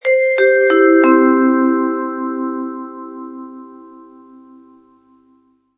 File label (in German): gong; elektronik; bbgong